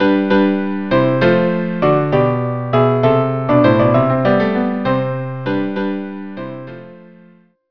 trio

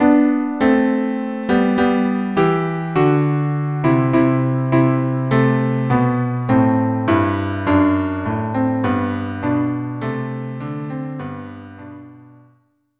Peasant Song cello duo

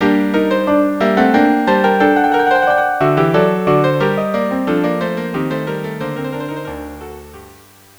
Hornpipe trio; Fairy Queen

